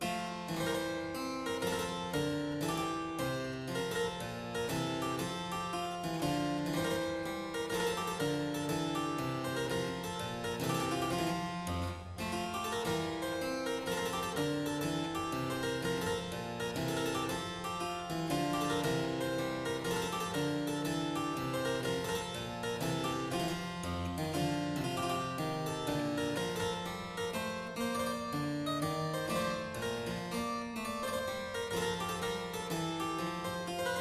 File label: Menuet, harpsichord; Suite in G major; Chambonnieres